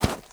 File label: horse footstep snow hard; Rust